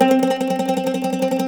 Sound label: MANDOLIN; StTremolMandolin